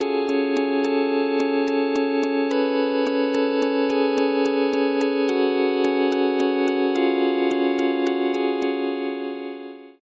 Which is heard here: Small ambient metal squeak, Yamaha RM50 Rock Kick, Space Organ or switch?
Space Organ